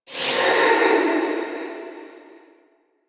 Evolving Weapons UI Node Select A; Zariman

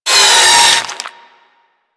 Flesh Impact, Weapons; Warframe; Tenno; Miter; Hit Effects

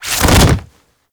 Tackle Start Foley; Manic

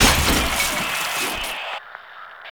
Destroy Television; Warframe; Ambience; Nineteen Ninety Nine

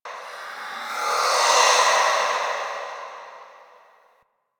Texture Interior Large, Ambience

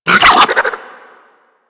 Infested Biped Flip; Warframe; Moa

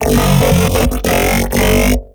Mech Ambient Vocal

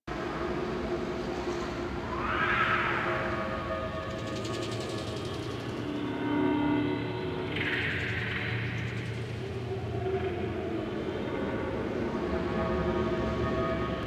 Interior Cave Medium Bed Loop, Ambience; Warframe; Infested Eris

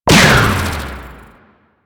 Sickle Heavy Combo; Warframe; Lasrian; Lasrian Soldiers; Weapons; Lasrian Butcher Sickle

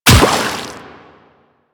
Run Water, Footsteps; Warframe; Quests; The New War; Kahl

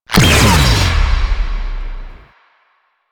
Missile Ability, Motorcycle; Motorcycle Ninety Nine; MC99; Vehicles

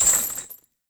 Rock Trap Chain; Objects; Ode Lantern Crate

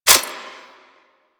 Reload, Weapons; Warframe; Zylok Prime

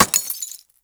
Bullet Impact Glass Large; Weapons

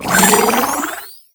Nokko Mushroom Capture Start; Warframe; Nokko Colony; Mushroom Hunt

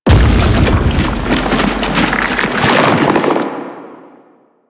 Octopede Lash Limbs Slam; Warframe; Man In The Wall

